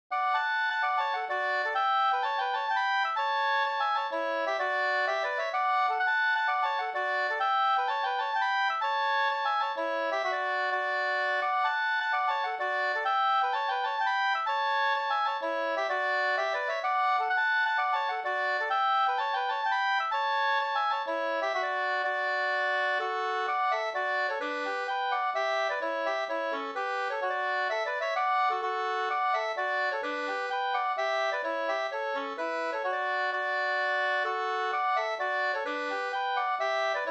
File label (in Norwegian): pols; Amerikaturen av Sven Nyhus no 2st